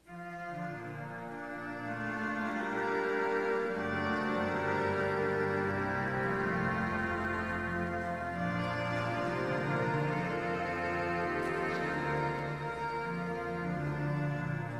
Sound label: National Anthem